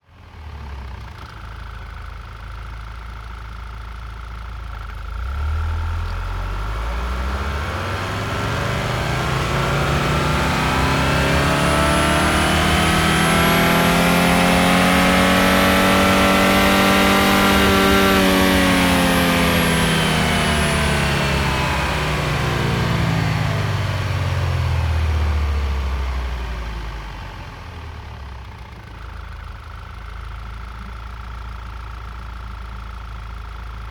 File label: audi a4 allroad 2.0 TFSI engine different rpm ramps; SoundHolder